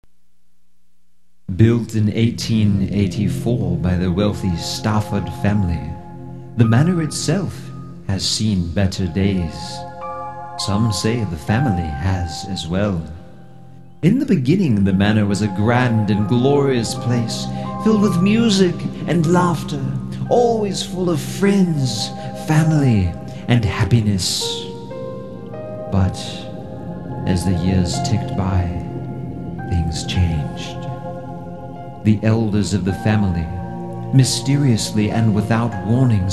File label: Music Bed; voiceover